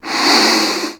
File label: sniff short; juniper